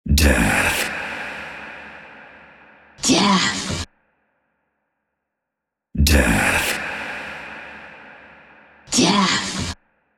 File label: vox; Pony Fan Music Remix Stems